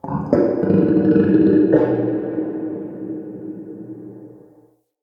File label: Day Croaky Creature